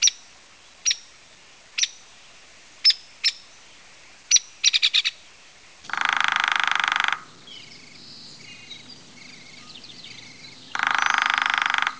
hairy woodpecker

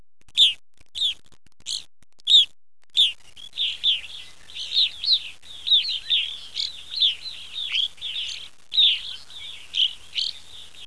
grosbeak

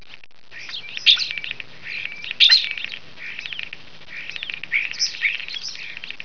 starling, songs

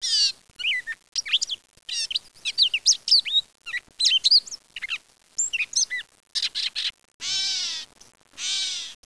catbird, songs

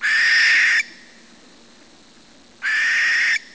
barn owl

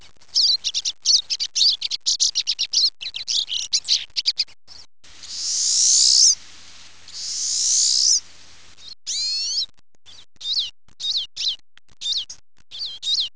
pine siskin

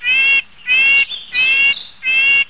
white breasted nuthatch, songs

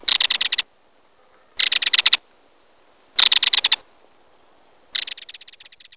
kingfisher, songs

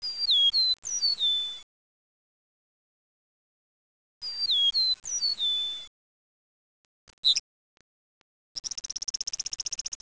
meadowlark, songs

